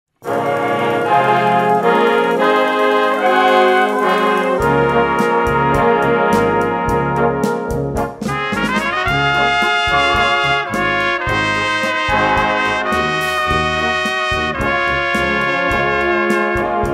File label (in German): Trompetentraeumereien